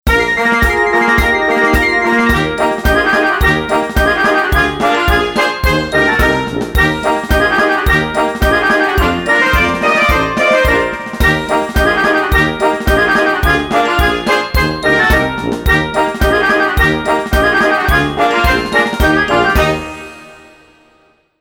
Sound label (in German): narhalla marsch